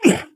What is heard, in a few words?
jump; Portal 2; Source Engine; video games; ballbot